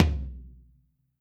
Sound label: FLR TOM MOD; Q Up Arts; Denny Jaeger Private Collection